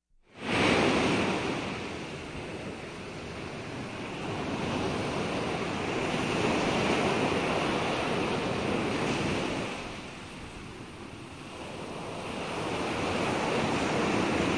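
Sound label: Sea rolling in powerfully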